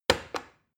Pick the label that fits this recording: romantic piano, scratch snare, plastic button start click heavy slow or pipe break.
plastic button start click heavy slow